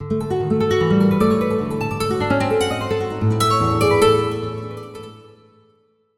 String Instrument Fast Arpeggio Phrase Reverberant